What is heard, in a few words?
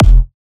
Punchy Kick